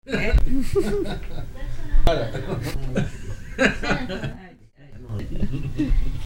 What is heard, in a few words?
laughs